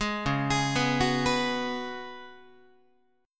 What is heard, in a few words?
Db 7th Suspended 4th Db down Guitar; arpeggiated